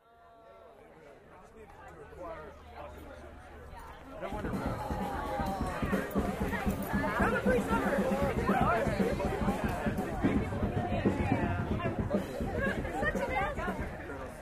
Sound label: chatter